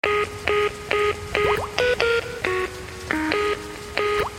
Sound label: Phone message